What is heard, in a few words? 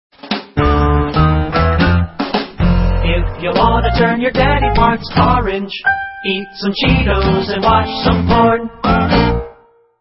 Rejected Commercial Jingles; Cheetos